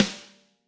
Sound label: Snare Hard; apulSoft apTrigga2; Steven Slate Drums